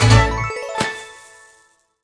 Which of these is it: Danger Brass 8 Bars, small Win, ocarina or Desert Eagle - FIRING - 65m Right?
small Win